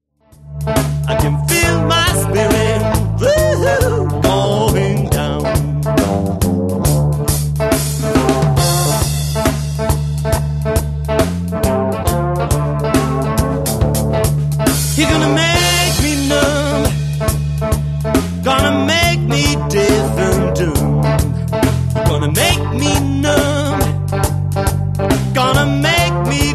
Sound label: moonshine blues